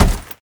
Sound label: land wood